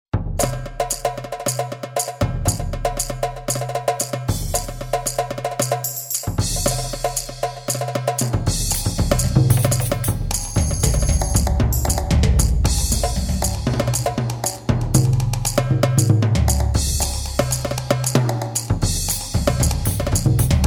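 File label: World Percussion; P3 Wrld Perc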